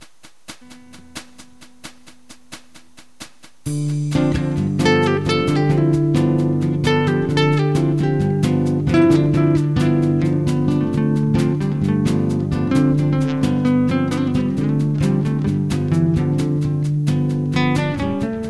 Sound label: wave guitar